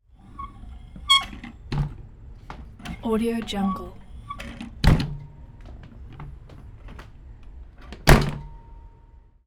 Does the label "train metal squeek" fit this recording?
Yes